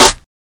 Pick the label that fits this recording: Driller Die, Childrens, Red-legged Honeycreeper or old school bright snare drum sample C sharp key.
old school bright snare drum sample C sharp key